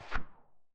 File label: undo; Microsoft Solitaire Collection